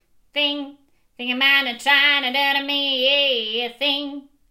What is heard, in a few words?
Twang nasal